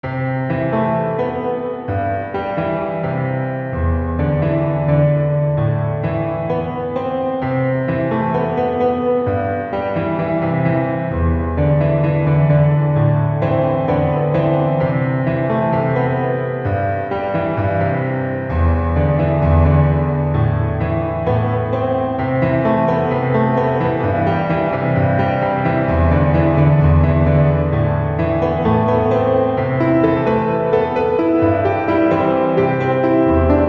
untitled piano

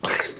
Spit; Lewd SFX

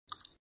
Suck; Lewd SFX

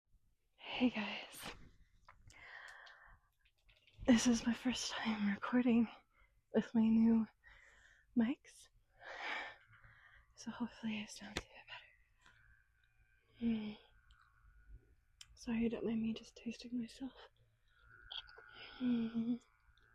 wet noises, lots of wet noises; quick ramblefap with new mics; F4A; Quicky with the new mics

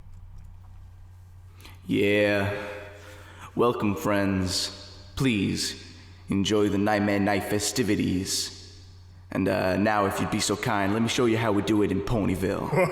Acapella; Semi-Original

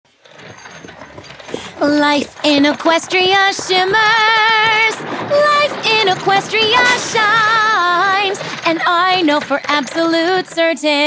Acapella; Season 3

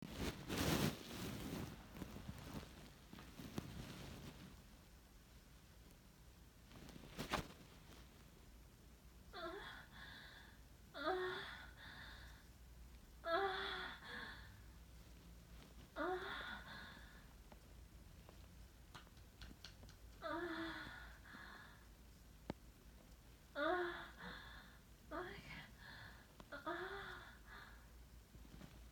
Moans

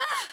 Short Scream; Lewd SFX

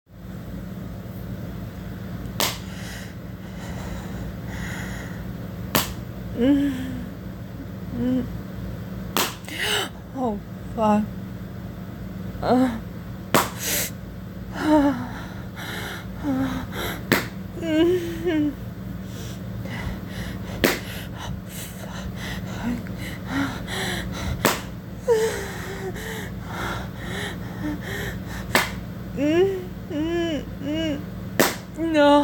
Short Spanking, Spanking, Whimpers, F4A

